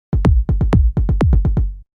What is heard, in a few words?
Hip Hop; Looperman